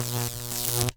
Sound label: electric zap